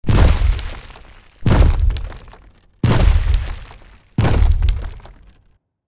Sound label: Large beast monster footsteps; fantasy